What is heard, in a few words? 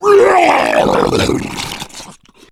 male boomer vomit; voice